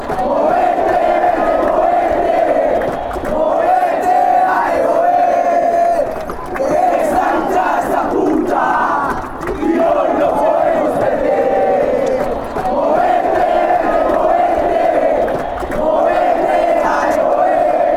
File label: atletico nacional chant